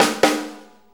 Shuffle Fill, Drum Tools

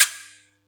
Yamaha EX-5

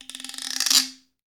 Guiro Wood; Club-50 - Foundations Roland

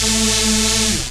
technosyn2, Techno Trance; Ueberschall; SYNTH

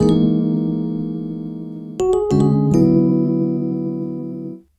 Keyboard

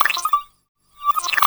MARTIAN, CARTOON SCI; Spectrasonic Distorted Reality 1

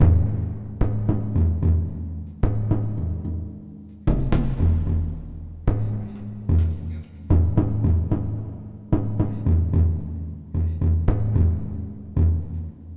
Drumz; Lounge; Zero-G Kocktail Kollection; Construction Kitz; Volcano at Sunrise 074; 90 s Sample CDs

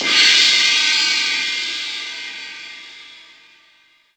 crash, Yamaha TG-500

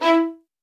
MED ATT E4, soft violins; Q Up Arts; Denny Jaeger Master Violin Library

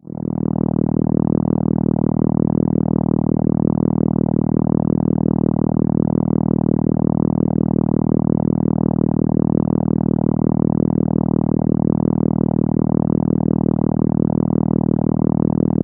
D#3, ARP Solina

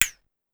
Woodblock Down, Pitch Env; Clavia DDrum-AT; Pitch Env Various

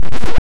PRC, Rap FM Perc, Roland; Rappin Perc

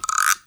SCRAPER, DUB FX; Drumdrops In Dub VOL-1; SINGLE HITS

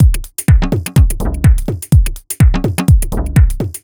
C# 125bpm, House Drumloops; 90 s Sample CDs; Zero-G House Fabrik Drumloops; ZG Drumloops

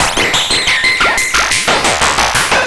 Serge, Synths Arpeggios; Electronic Point Blank

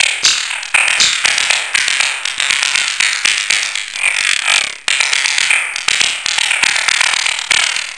Structured Rhythm, IDM Rhythms

